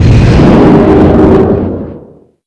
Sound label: blink